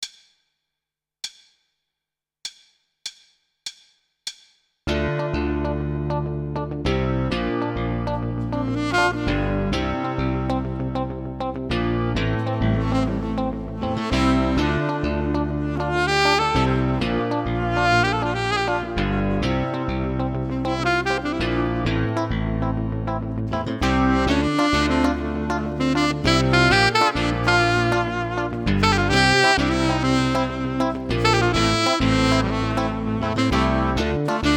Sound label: Rock Funk